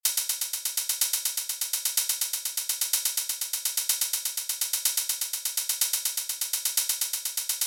Top Loop Melodic Techno

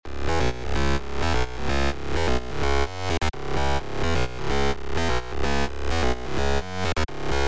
Bass edm Hive

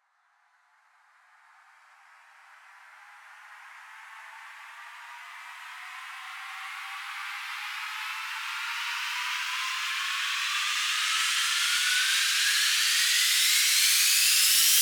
Fx Samples Techno House

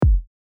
Kick Deep House

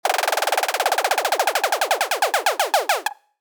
Fx Serum Techno House

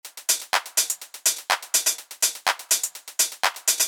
Top Loops

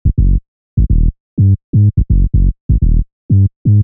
Bass Serum Techno House

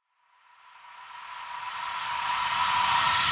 Reverse Trance

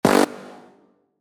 Acid Samples trance

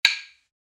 Perc One Shot

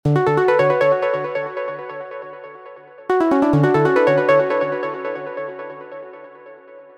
Pluck Trance Spire

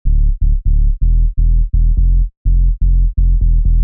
Bass midi Techno House

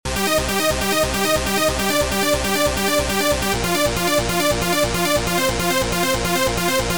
Lead Trance Vital Trance

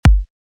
Edm Vip Kick; EMP

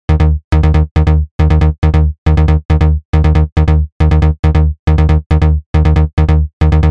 Bass Trance; Vital Trance

